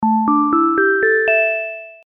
synth bell

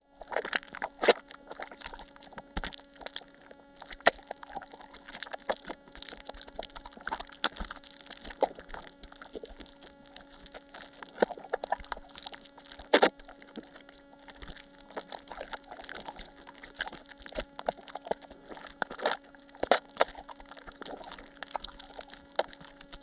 squashy sticky long

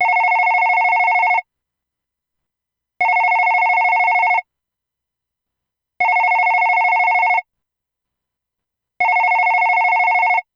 telephone call

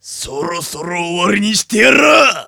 voice attack

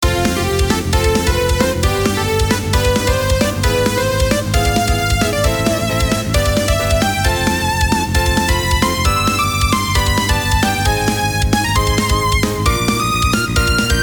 rise melo

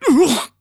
voice damage

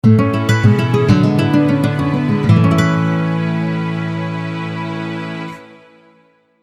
jingle arpeggio

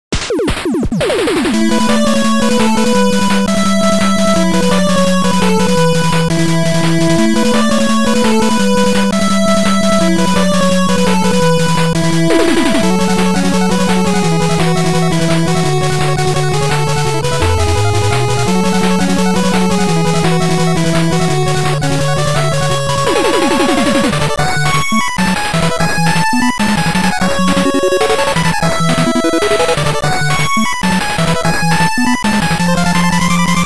8bit act title

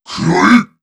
voice monster

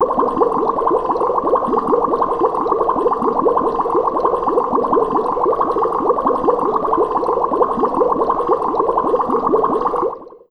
bubble long

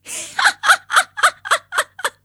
voice laugh

